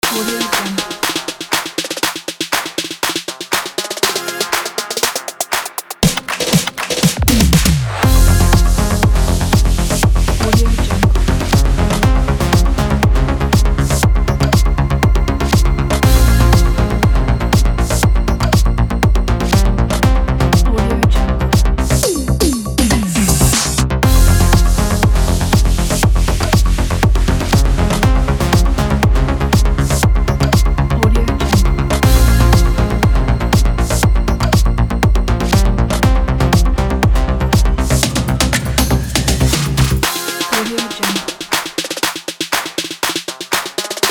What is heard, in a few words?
techno